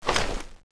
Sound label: draw empty; sven; custom weapons; cso; failnaught